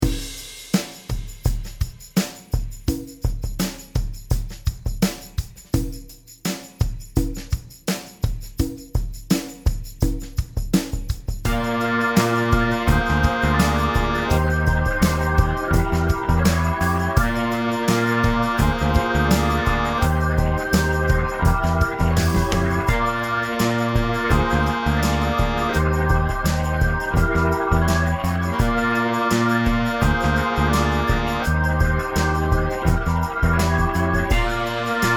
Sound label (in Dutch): Langzaam